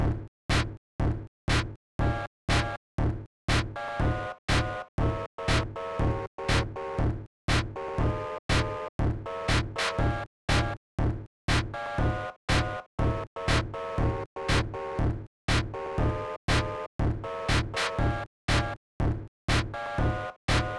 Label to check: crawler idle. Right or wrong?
Wrong